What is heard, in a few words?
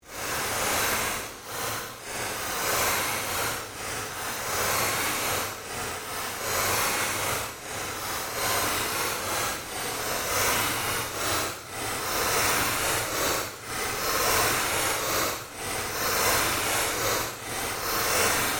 Created Fluctuation Drone, Drone Sound Effects; sound building blocks created through manipulation of raw material